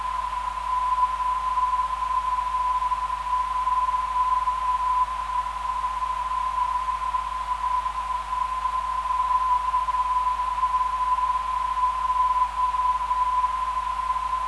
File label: 337kHz, NDB; KAJ